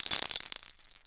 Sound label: Rattle; DS; NEWSMB